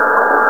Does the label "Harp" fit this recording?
No